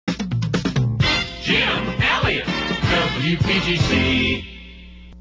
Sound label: jingles; positron